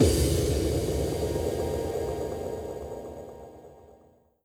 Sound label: Game Over